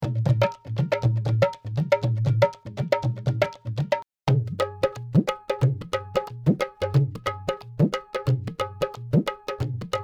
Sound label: dholak